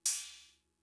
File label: Cymbal, Fricke MFB-522, Drum Machines